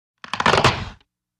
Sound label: Wood Splinter Breaks